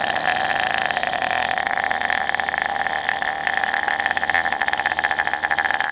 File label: engine; edrive